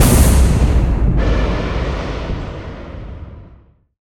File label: impact; bluezone